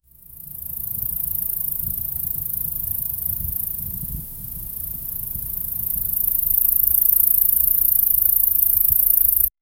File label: sounds of a crackling fir; evening